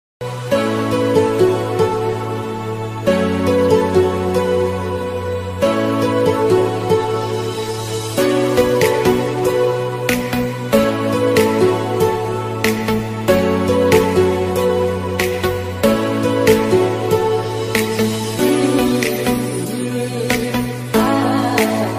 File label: love ringtone gujarati new song gujarati ringtone gujarati instrument ringtone